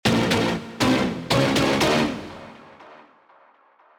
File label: serum preset brass